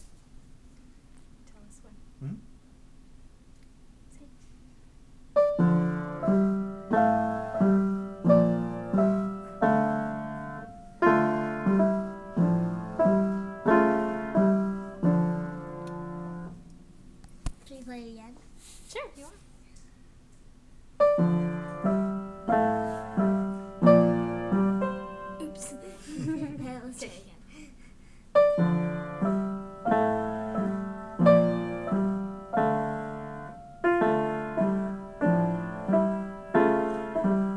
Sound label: duet